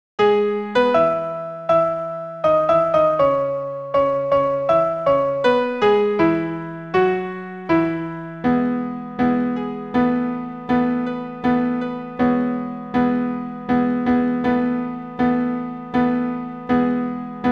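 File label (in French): alto piano accompagnement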